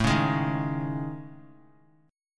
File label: A Major 7th Flat 5th, Guitar, chord; AM7b5 strummed; x,0,1,1,3,x; JGuitar